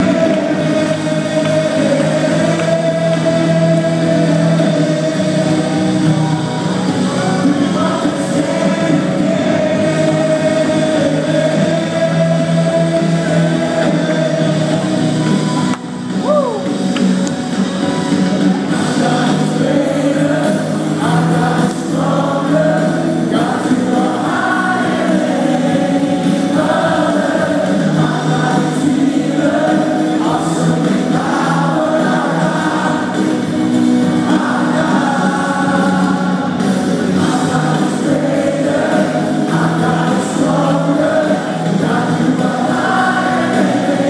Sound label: Music at the Unity Rally. Lots of singing along; rnc2012